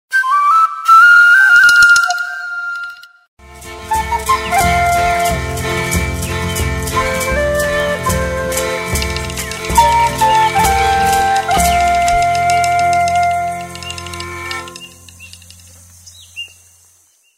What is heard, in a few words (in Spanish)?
Ambiente